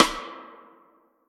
normal hit whistle; osu

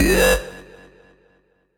bass tek

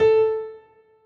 Key A5

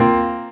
Synth; osu

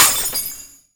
glass

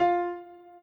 piano intro

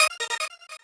normal hit normal

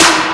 soft hit clap

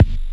Drums Kick

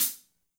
hihat closed; osu

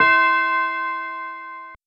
RBell E5

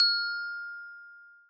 F6 bell

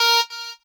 Lead

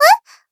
combo break

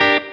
clean 5 7 6 Dmaj7 Staccato

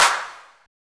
Clap

